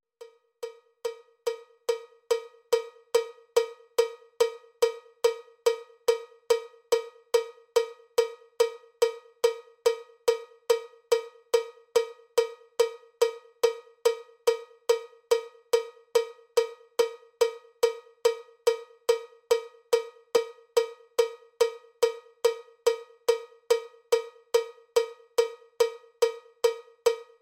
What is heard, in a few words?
cowbell click track bpm 79; drumtex; cowbell click tracks vol 1